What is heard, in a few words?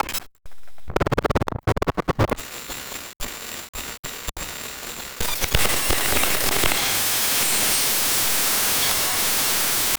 laugh whisper glitch stat